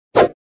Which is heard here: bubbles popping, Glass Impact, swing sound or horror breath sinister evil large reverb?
swing sound